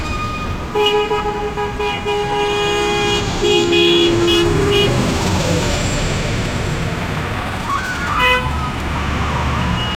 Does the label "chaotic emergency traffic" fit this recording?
Yes